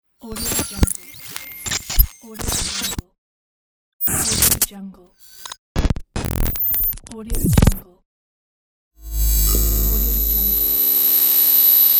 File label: glitch pack